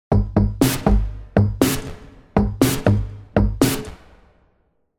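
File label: game background music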